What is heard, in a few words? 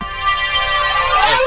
weird air, pads